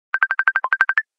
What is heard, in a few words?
Facebook messenger ringtone